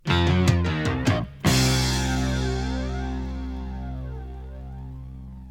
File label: topformat maxi jingle single